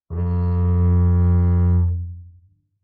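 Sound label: Bass arco fortissimo sul E G2, Double Bass; MIS Pitches - 2014; Strings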